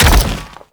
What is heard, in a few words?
Step Default, Foley; Warframe; Sentients; Erra